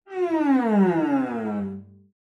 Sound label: Cello Slide Down; SoundsCrate